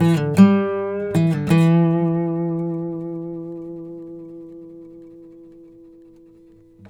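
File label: baritone lick E, Blues Guitar; ILIO; Fretworks; 120 BARI LICK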